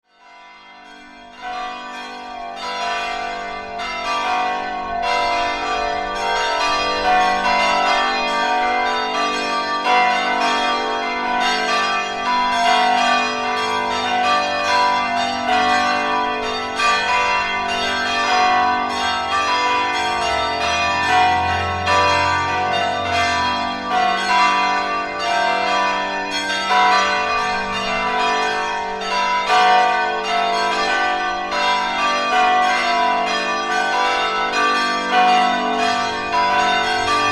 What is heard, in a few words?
post heliotrope bells